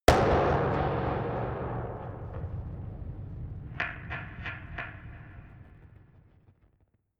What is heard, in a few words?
gun dark pop gun; schusswaffen